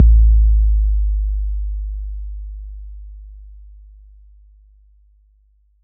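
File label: bass drum 808 Decay F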